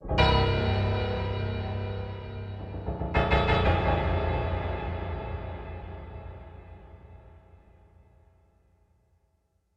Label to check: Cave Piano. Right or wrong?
Right